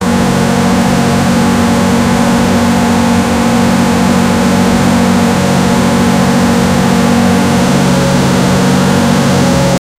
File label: airbus a380 growling engine; aircraft